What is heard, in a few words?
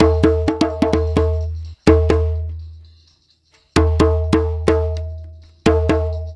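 percussion, Roots Disco; USB Soundscan vol.32; AKAI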